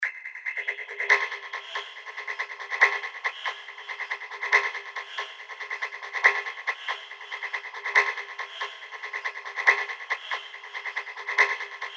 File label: Perc FX Loop 140 BPM; Asthma; revealed fx loops vol 2